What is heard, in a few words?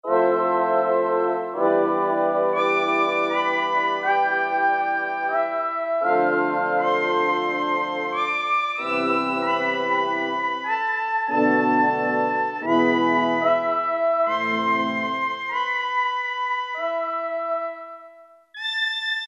FEMALE VOICE, Casio VZ-10M; VZ ROM Card